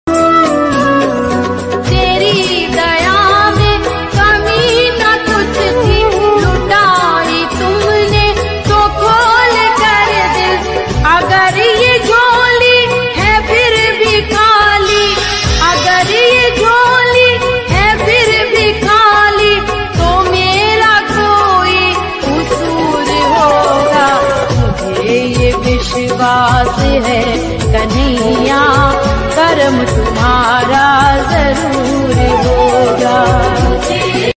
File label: jai radha madhav lofi version ringtone; radha krishna bhajan